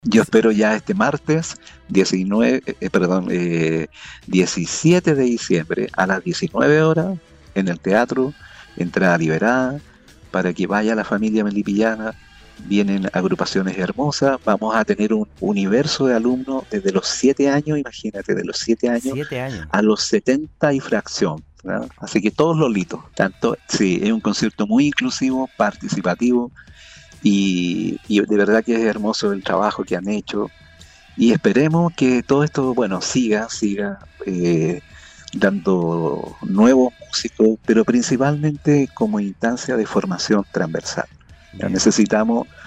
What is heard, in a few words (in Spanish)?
GUITARRAS EN NAVIDAD; tradicional concierto guitarras de navidad en el teatro serrano